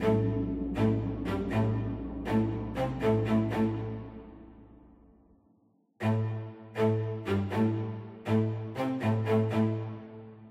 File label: Rnd Staccato; Workshop; tintin reporter cigars of the pharaoh